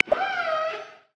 Orca, Polar Region; Xiaomi Redmi-8A

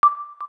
KEY XYLOPHONE 9, keytone; Samsung SGH-T369 Linx

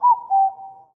Cuckoo

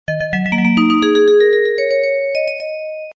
boot audio; China Mobile

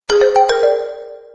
Alert Xylo; Microsoft Windows-Mobile-6.5

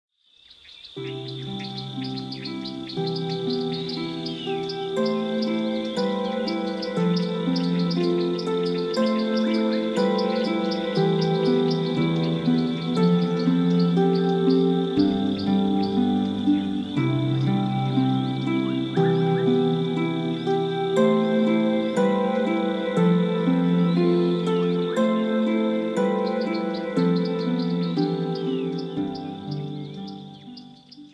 Morning, alarms; Wiko; Jerry-2

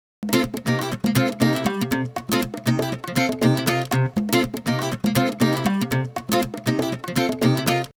Funky Guitar, ringtones; Motorola Moto-G20; lenovo

